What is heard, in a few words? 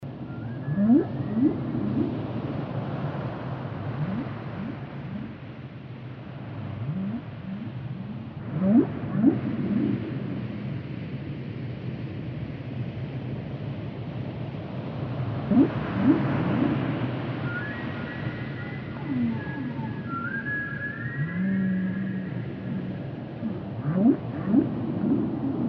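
sound of a whale under water; Завораживающие звуки китов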